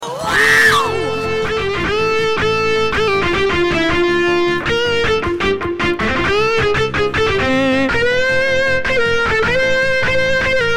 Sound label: gtr solo